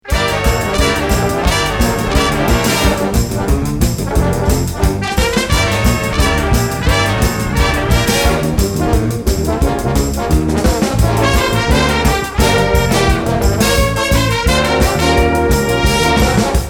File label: Jazz